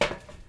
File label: Truck Step; Phasmophobia